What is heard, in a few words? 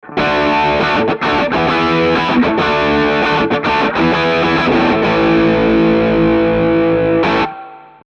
EGit Normal Preamp Gain; MixPre II; 32Bit Float